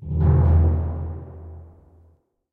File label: spinstart; rome